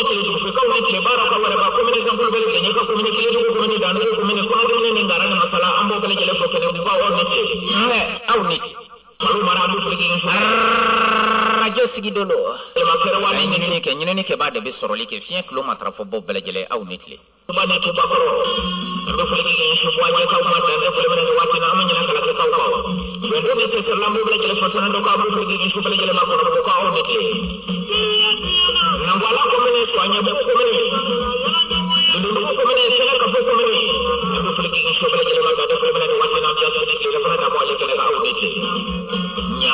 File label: AMBIENCE MIDI RADIO